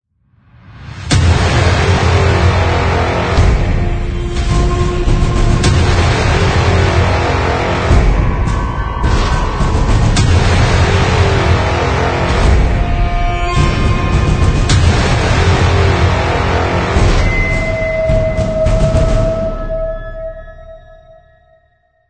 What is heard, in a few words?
Epic Logo